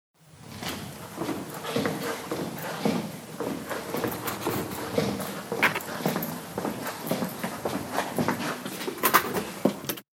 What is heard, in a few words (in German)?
Schritte